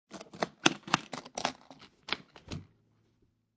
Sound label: Guitar Case Open Sequence, Foley, Guitar Case Being Opened, Four Buckles Opening In Sequence; Latch